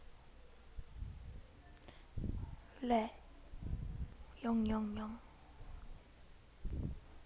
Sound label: laugh